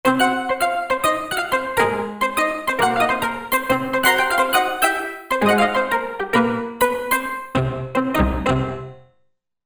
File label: 2 voices; Korg MSC-12S Synth 3